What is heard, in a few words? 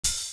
Hat, Closed Hats; The Drama